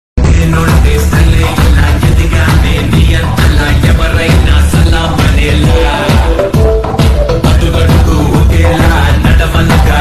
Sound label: Telugu ringtone Tamil bgm; billa